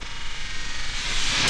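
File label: cymbal, 160 BPM, drum & bass; Ueberschall; Drum & Bass Flash Trackz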